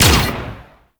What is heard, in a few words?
Downmix Shot; Protea Prime Boss; Warframes